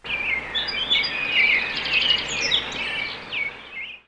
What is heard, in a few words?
STARTUP